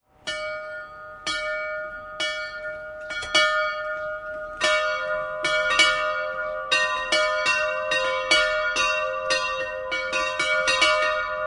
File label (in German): Sankt Bartlmae, glocken